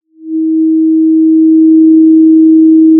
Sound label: Sound of a cruise ship horn